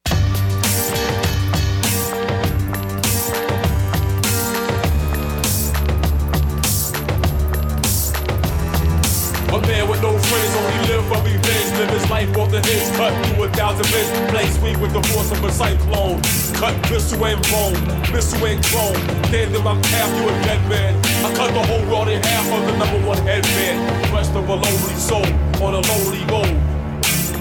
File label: Afro Theme